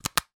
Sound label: air freshener refill insert into device; household; glade air wick; sound effects; sound effects one